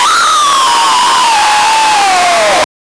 jumpscare sound; venom

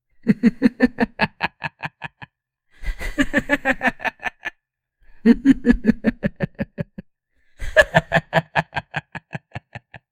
a hearty laugh that echoes in the background

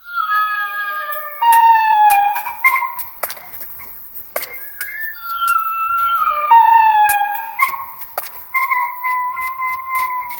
a chimp screaming or low

